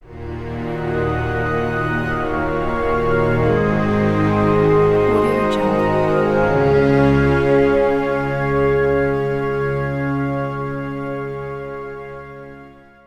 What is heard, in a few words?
warm orchestra; audiojungle